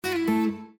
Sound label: Windows Hardware Remove; abbottabad compound